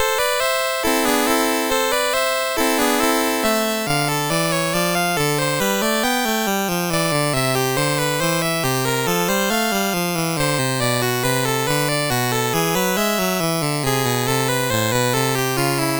MOS6581; MOS6581R4

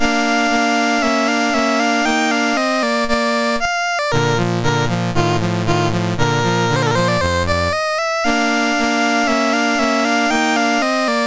Piano Concerto in D Minor, MOS6581R3; K466

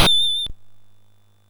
sid MOS6581R4

